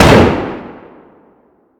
shotgun fire atmo int; gmod; viper

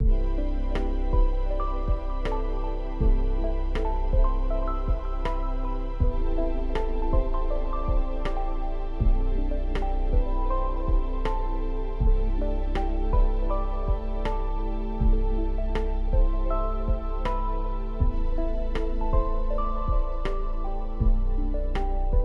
Music Ambient Night; MiSide Soundtrack

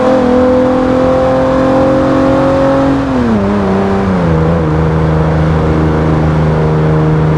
fourth cruise, vehicles; gmod; tdmcars; lex isf

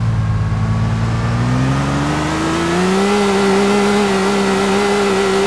first gear reverse, vehicles; primo

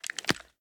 raise, reloads; gmod

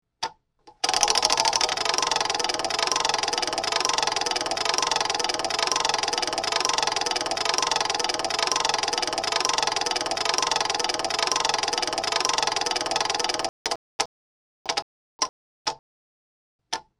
mystery spin, casino; gmod; pcasino

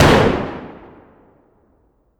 shotgun fire atmo int; gmod; viper

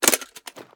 reload drum drum out; reloads; gmod

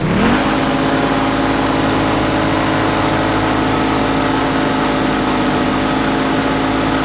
gokart boost; freeman; vehicles

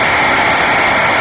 turbo, vehicles; gmod; tdmcars

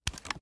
reload empty mag grab, reloads; gmod

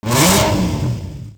rev, vehicles lambo2

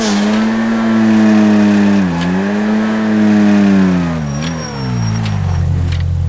throttle off, vehicles; airtug

